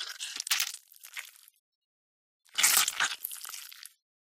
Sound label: suture; Barotrauma; Neurotrauma